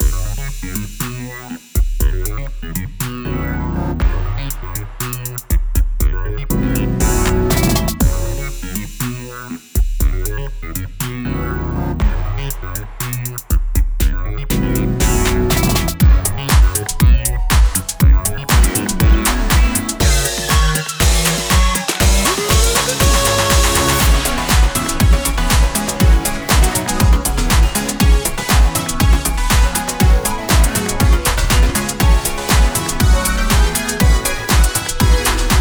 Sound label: Intro Gameplay; Everybody 1-2-Switch!